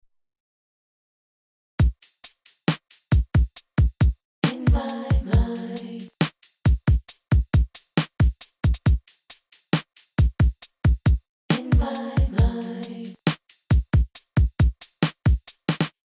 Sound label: drums on